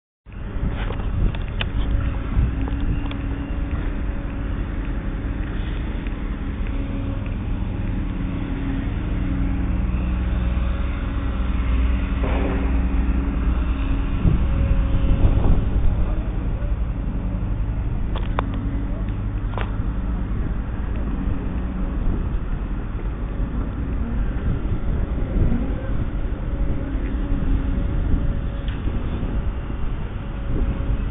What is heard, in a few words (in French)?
Travaux industriels a proximit du port de plaisanc